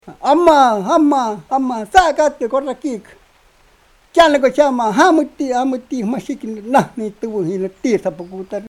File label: shouting